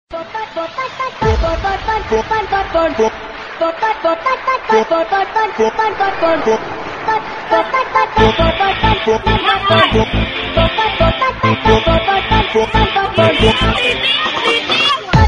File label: dance, Big Bass Dance Songs